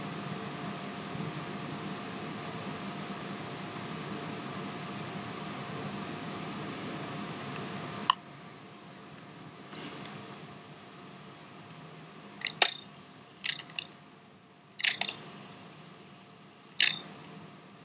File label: RINGTONE